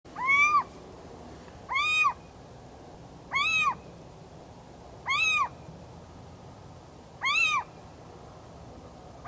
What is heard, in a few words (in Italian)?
civetta canto territoriale canto